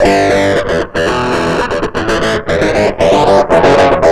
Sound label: FX DIST; FX DISTO